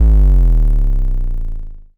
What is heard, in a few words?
kick, Heartbeats